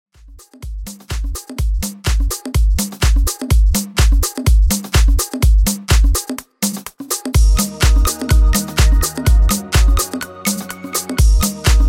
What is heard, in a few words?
Club House, 125 Bpm